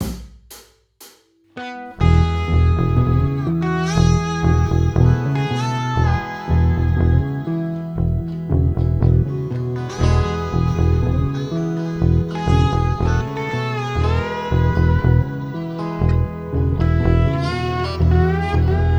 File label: no Drums 120bpm; stereo